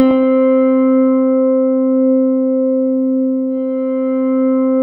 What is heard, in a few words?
C4, SynthLine; 90 s Sample CDs; NiceBeats; SynthLine VOL-33